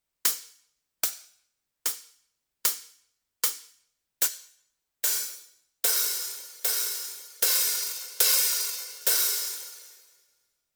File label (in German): Dynamik HiHat